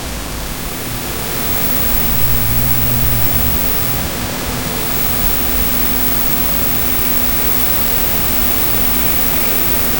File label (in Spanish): noche solitaria ruido, static